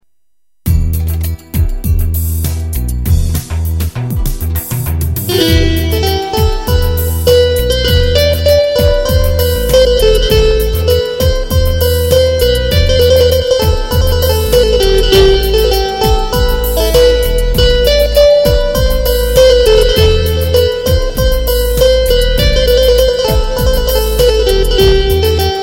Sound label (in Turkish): elektro